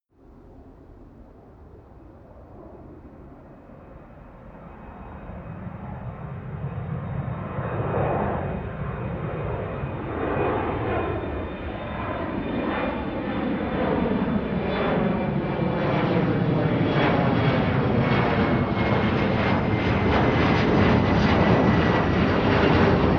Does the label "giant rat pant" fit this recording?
No